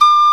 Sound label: MANDOLIN